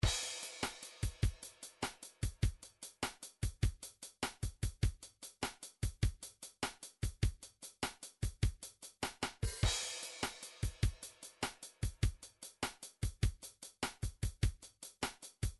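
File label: midi drums slow rock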